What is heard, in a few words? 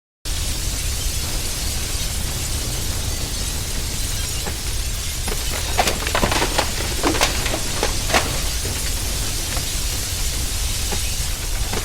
earthquake debris noise